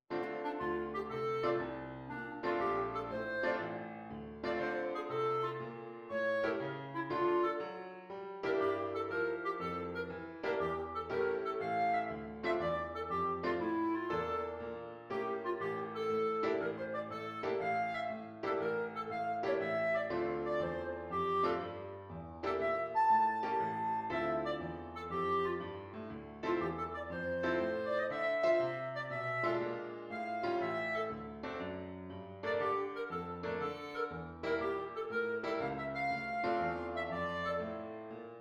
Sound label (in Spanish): swing y tango